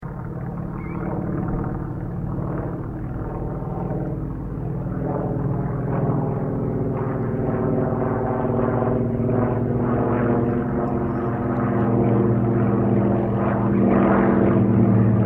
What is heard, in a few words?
aircraft yakovlev yak-52 flying general